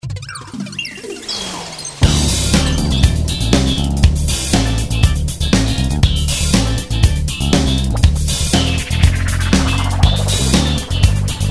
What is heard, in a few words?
eurodance